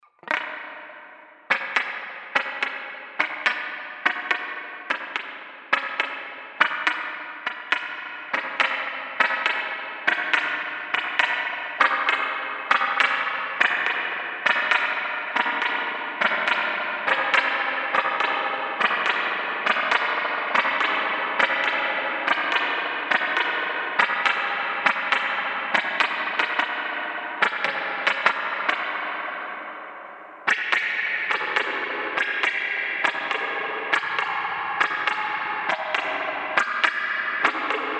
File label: Spring Amp Guitar